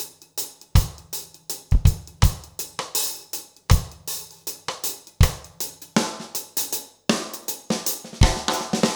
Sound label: reggae 80